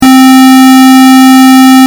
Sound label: C4 Square